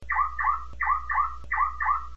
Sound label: Car Alarm